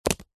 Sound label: card beer mat set down on table